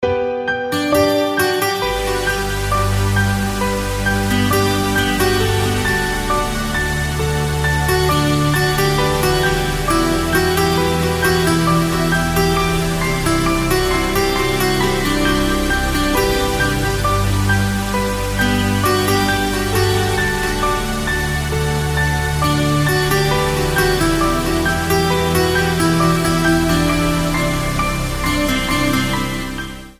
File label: instrumental